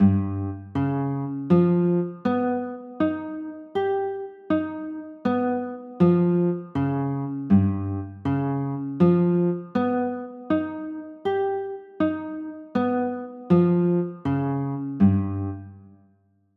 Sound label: c sharp 9b5, chords